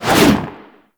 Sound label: Generic Spin Whoosh; Warframe; Characters